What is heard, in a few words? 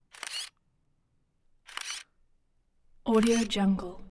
camera shutter; prosoundfx